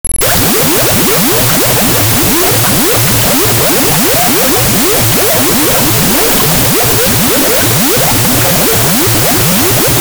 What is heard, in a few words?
Bubbles; 1001 Sound Effects; Foley